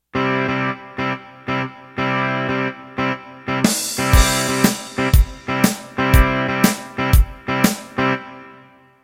Country Shuffle Intro

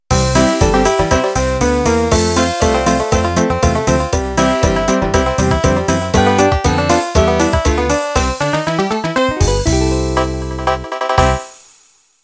Bluegrass end

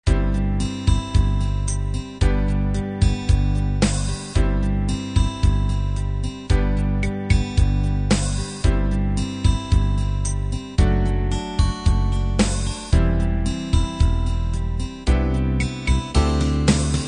unplugged 8bt

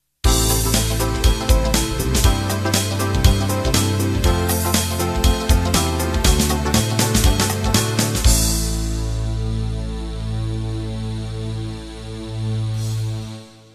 Fusion End

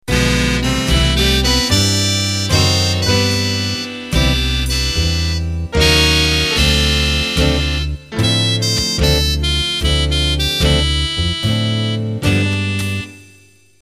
Band Intro; MIDI; Intros and Endings

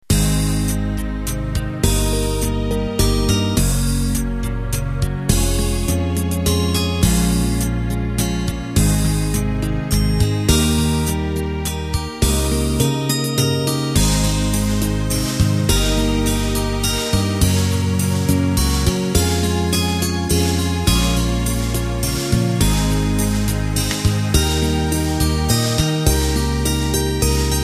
Waltz; Acoustic Steel-Stringed Guitar, Nylon Stringed Guitar and Brushed Drums - for Pop, Country, and Folk Waltzes; Waltz 3x (pop) & Waltz 3y (pop)

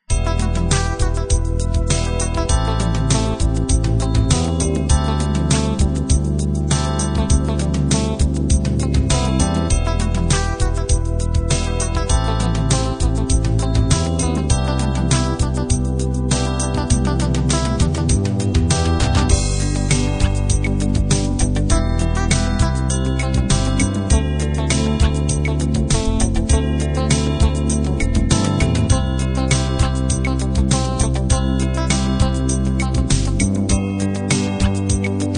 pop ballad

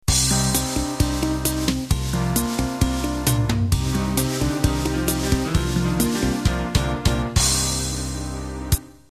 Pop Bossa End

